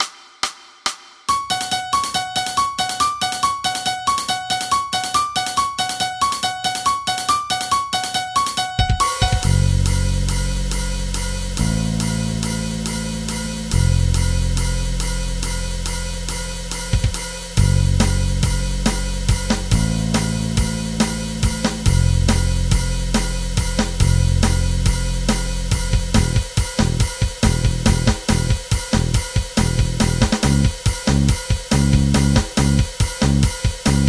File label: Backing